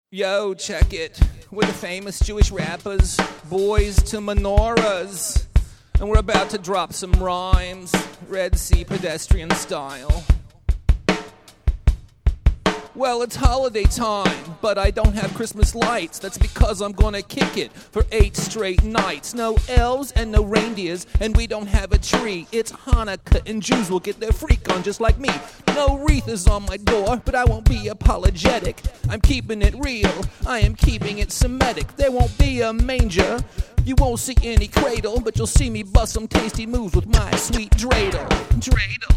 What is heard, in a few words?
hanukkah rap